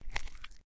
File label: slime hit